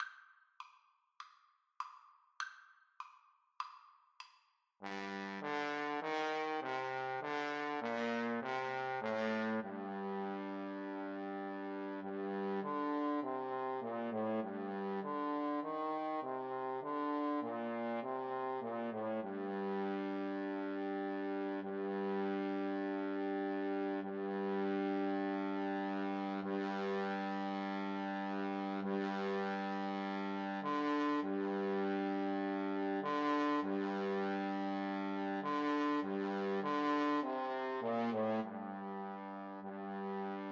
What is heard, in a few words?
Trombone Duet